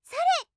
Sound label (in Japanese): 攻撃